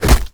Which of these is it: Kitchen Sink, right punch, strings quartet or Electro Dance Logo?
right punch